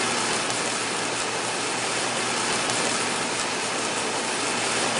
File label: flare, Tools; Subsurface; Barotrauma